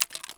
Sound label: mr133 shell in port; Escape from Tarkov